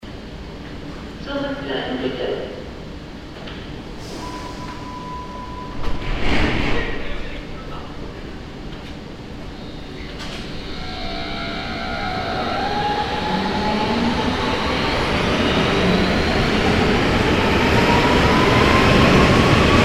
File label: subway departure